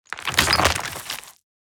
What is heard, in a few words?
Stones on gravel and dirt; mud and dirt